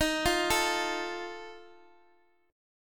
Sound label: D sharp Suspended 2nd Flat 5th D sharp, Guitar Drop D; x,x,x,8,6,5; chordshape